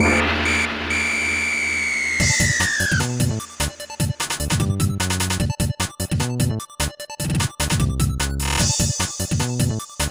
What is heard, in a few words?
Game Finished; Getter Love!! Cho Renai Party Game; N64